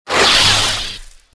saber hit; weapons

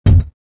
door sealed, doors; movers

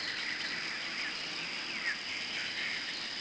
jungle; Ambient; MX vs ATV Unleashed; XBOX

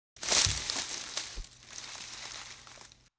Brush Rustle; Environment

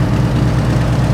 Idle, Engine, Spitfire

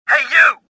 anger; worker1

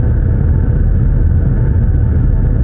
hauler, ambience; cairn

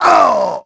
GRUNT; NIXON; Pulse Racer

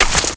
ice, bullet impacts; chopper; Soldier Of Fortune II Double Helix; XBOX

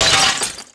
glass tumble

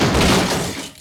smash

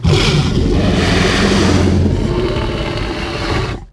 Trex Growl; Dino

